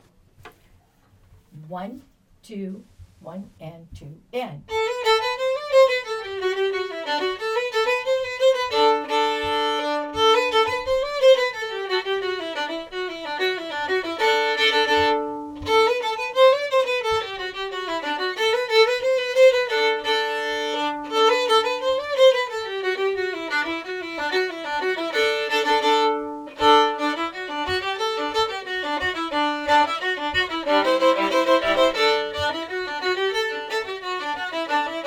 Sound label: Old Joe Clark break fast version